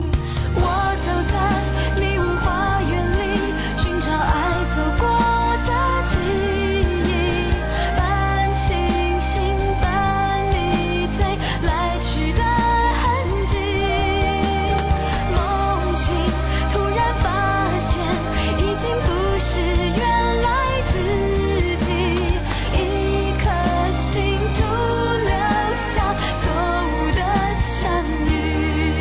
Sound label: chinese song